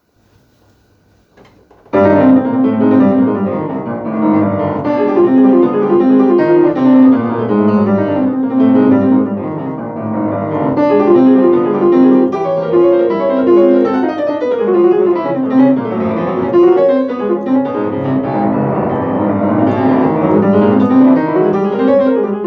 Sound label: Piano Sonata; no.2 2nd mov